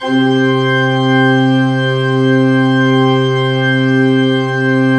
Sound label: C2, Cathedral Organ; ILIO; Propeller Islands Cathedral Organ